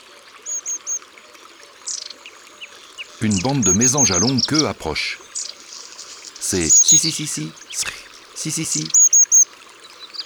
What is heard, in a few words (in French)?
Mesange a longue queue; abc de la nature